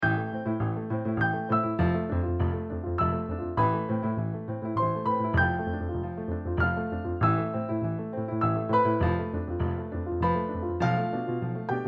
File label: piano